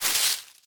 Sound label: leaf litter step; block; minecraft